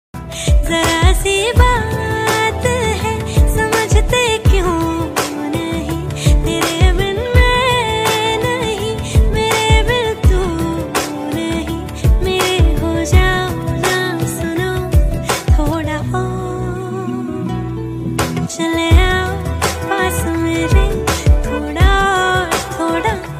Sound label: female version hindi ringtone